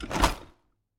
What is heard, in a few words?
Equip radio